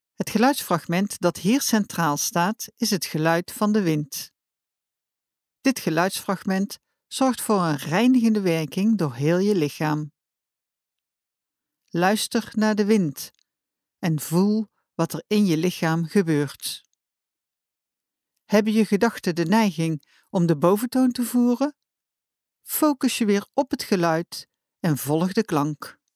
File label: Wind Vocal